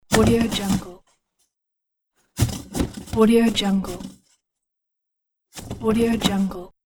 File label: toilet paper roll